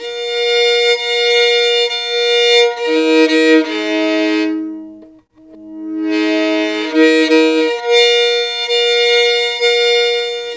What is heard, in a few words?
STR SOLO, Solo Violin FX; Roland L-CD702; Solo Vln FX